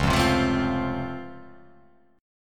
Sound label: C sharp Augmented Major 7th down, guitar chord